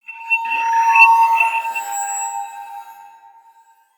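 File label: Cave Crystal Magic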